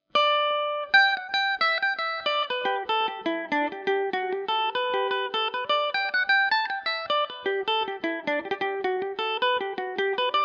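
blackthorn stick G major revelation revisited, mandolin; The Blackthorn Stick; Revelation Jaguar electric mandolin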